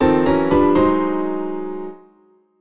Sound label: Item Catch Piano; The Legend of Zelda Ocarina of Time